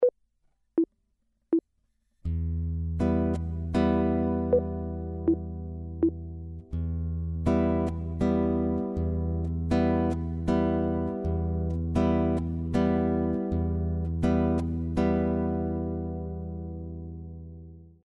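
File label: chord, guitar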